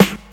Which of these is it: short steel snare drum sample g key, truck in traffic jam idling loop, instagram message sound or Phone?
short steel snare drum sample g key